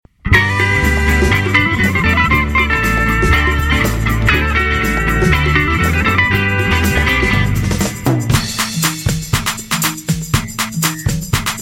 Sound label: Samba Inst